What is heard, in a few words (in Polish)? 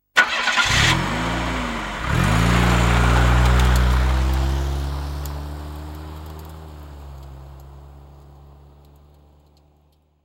samochod